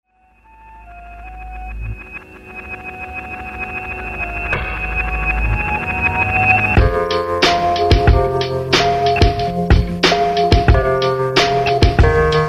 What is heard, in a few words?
mystery noir whodunnit; spinX